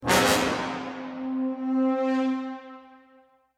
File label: Capture Player; Granny 3; Android